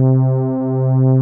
ANALOG PAD C3; Music Store - Claudius Diemeter Schwerlos

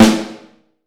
Snare Drum; Q Up Arts; Johnny.C's Vintage Snare Drum; 6.5X14

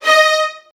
2D#2, Strings

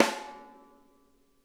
SNARE

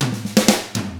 rock, drums; ILIO; Double Platinum Drums 1

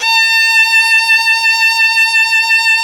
SOL VI, Synclavier Strings; ILIO

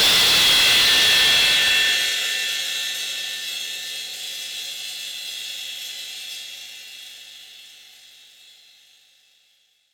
FX CRASH, Drums Acoustic; FX KIT; USB Soundscan vol.10; AKAI; 90s sample CD

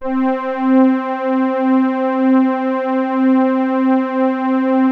C4, ANALOG PAD; POLARIS; Music Store - Claudius Diemeter Schwerlos

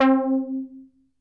SEQUENCE C4; SEQ AND PERC; Music Store - Claudius Diemeter Schwerlos

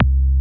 MOOG C2, ANALOG BASS; Music Store - Claudius Diemeter Schwerlos

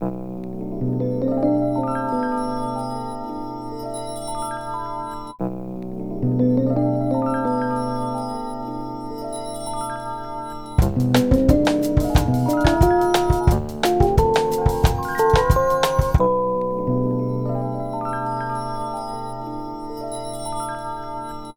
Melody; Sonic Foundry; Westside Underground

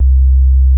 MOOG LOW C2, ANALOG BASS; Music Store - Claudius Diemeter Schwerlos

